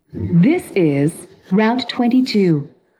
vocals

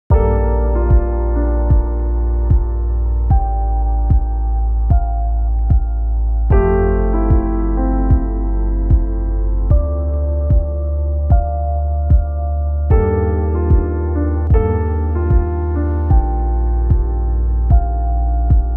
chill house

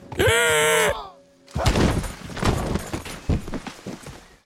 ahhh

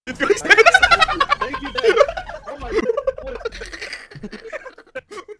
laugh

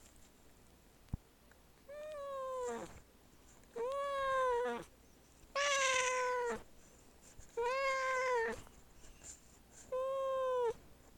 cat dying

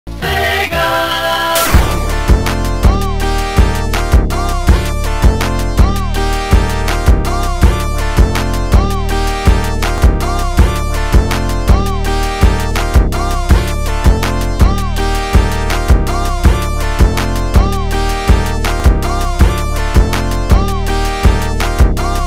phonk remix; sega

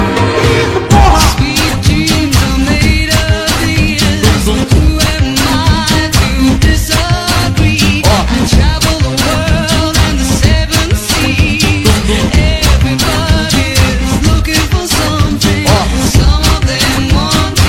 funk remix brazil

